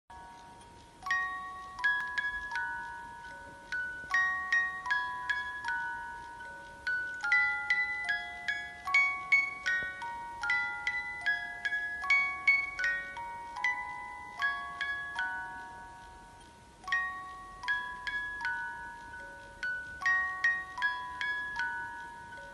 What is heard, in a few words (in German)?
Spieluhr Entchen, Schlaf Kindchen